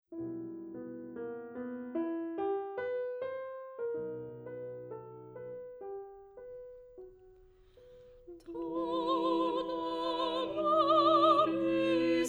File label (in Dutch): Bas, Agnus Dei; Rossini; oefen CD; Onversterkt